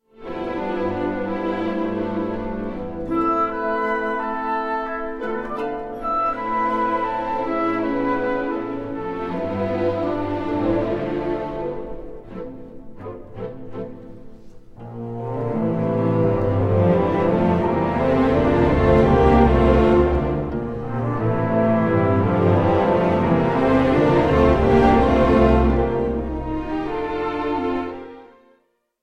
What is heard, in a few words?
suite bergamasque arranged for orchestra prelude; claude debussy